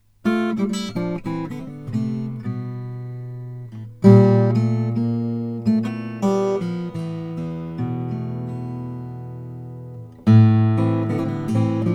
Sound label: sj brazilian rosewood; olson